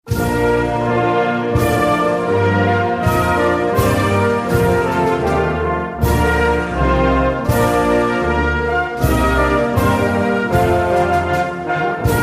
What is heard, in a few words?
spanish anthem ringtone